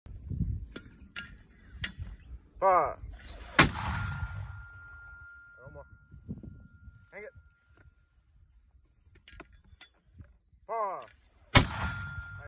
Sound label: Mortar 60; خمپاره ۶۰